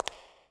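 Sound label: reverb walk, footsteps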